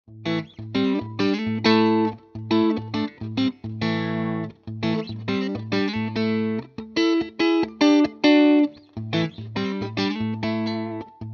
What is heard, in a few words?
Bridge Neck